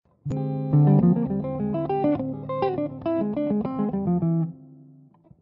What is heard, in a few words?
Locrian nat. 2 Jazz Lines, guitar